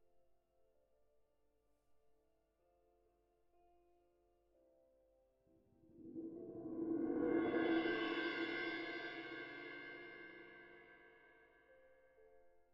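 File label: cymbal close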